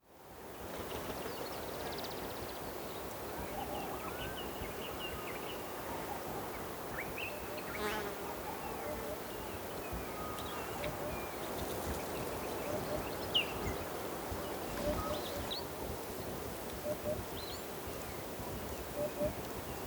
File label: african bush birds stream